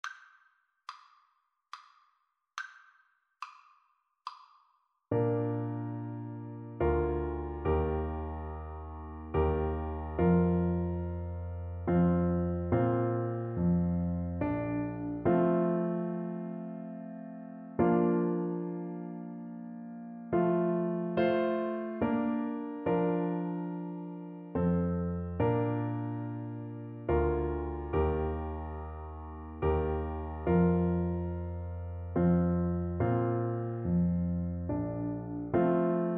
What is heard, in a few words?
mozart minuet, oboe